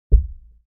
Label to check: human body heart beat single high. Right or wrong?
Right